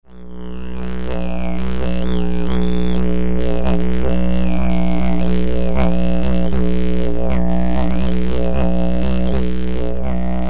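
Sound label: paper, didgeridoo; Pappe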